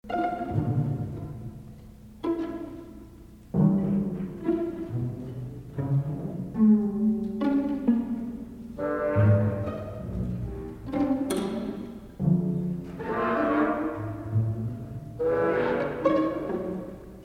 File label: points gliss